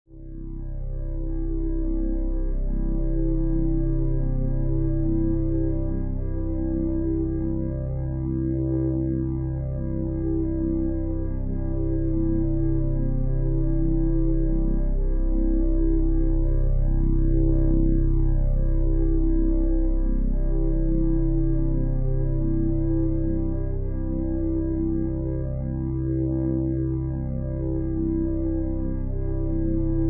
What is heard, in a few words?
synth vox; Gore Screaming Show; A mirror of desperation